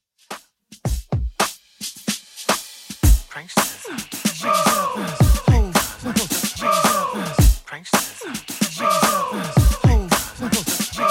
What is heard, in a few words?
2ks Redrum 110bpm